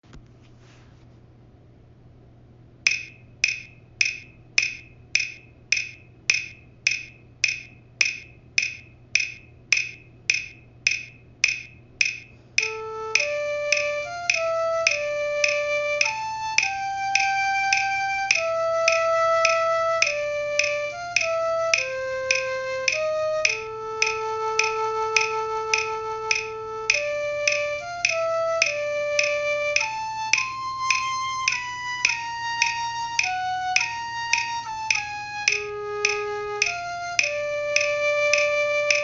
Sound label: flute; harry potter